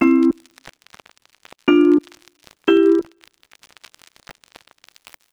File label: Vibra